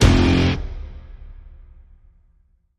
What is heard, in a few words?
STAB Guitar Single (F)